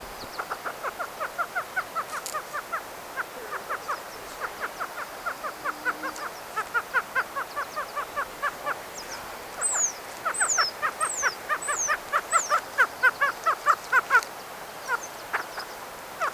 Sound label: Grouse